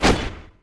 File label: spirit attack; FlyForInsanity